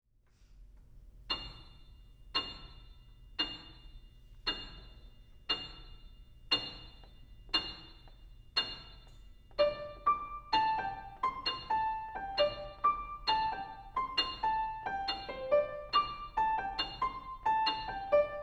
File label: four pieces for solo piano